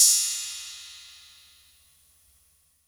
Ride; Drums; Ableton Live 12 Lite Core Library

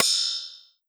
E-Perc Spring, Electronic Percussion; Drums; Ableton Live 12 Lite; Core Library

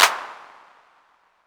clap, drums; Playdate SDK; MIDI Player

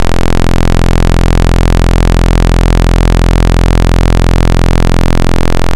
Saw PW Detune; Basics; Ableton Live 12 Lite; Vector; Sprites

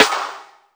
Snare, drums; Ableton Live 12 Lite Core Library

